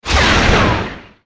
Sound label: spring